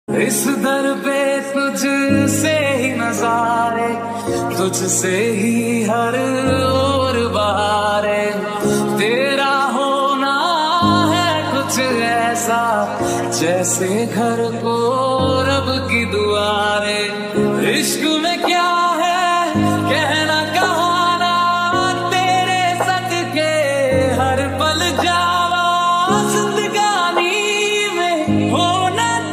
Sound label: Mind Relax Lofi Mashup